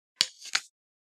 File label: Grab arrow